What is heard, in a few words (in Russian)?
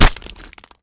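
губительный короткий удар мачете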